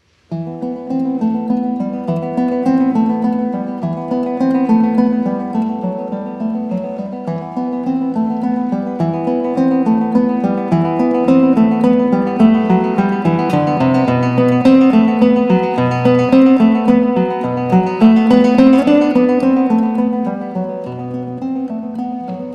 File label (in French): Guitare